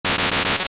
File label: Spark; Vaati's Wrath (3rd battle) forming a spark to shoot